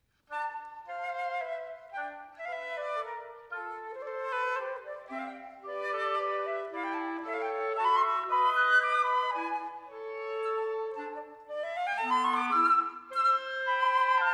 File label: WW Trio Mvt3 Menuetto, woodwind trio